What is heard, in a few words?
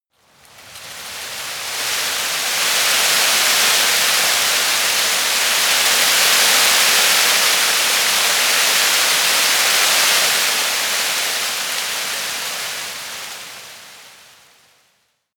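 tree leaves rustle heavy, garten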